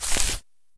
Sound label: grass footstep